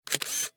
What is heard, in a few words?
shutter